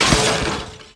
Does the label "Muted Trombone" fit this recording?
No